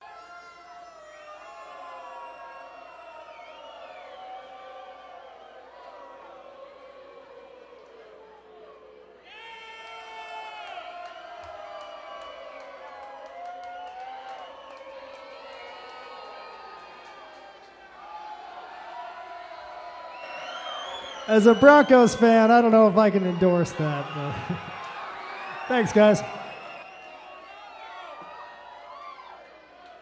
Banter Crowd

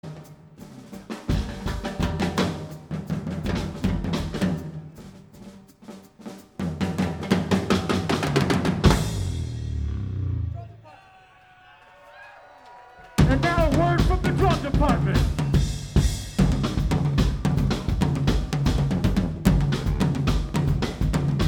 Drum

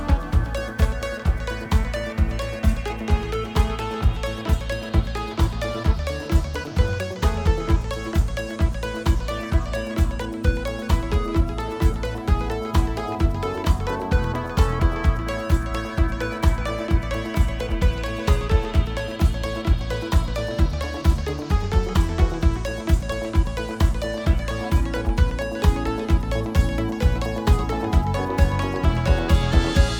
Amborella Jam

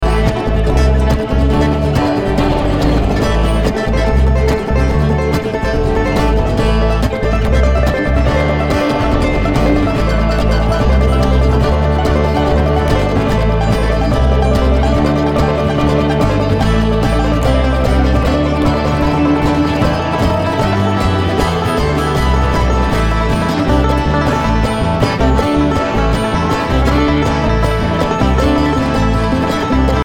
Traffic Jam

